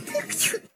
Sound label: cutest sneeze